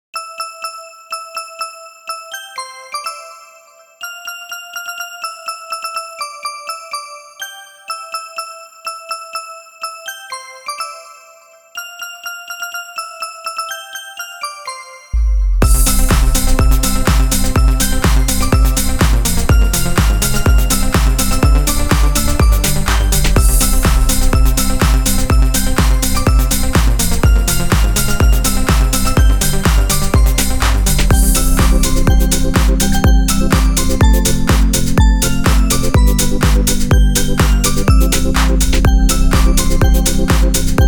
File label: Jingle Bells Remix; One Horse Open Sleigh